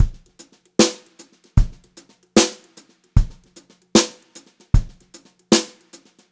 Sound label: beat, Brushed Drum Loops; USB Soundscan vol.39; AKAI; 90 s Sample CDs